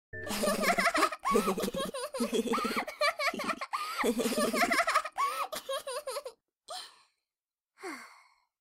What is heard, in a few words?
cute